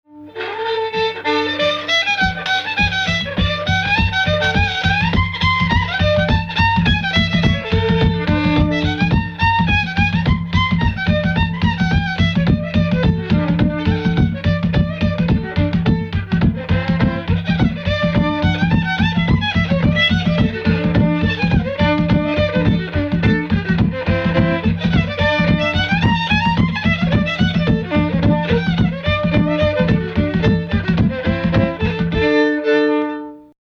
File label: D Reel Modal